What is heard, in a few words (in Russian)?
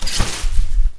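зонт мгновенно свернулся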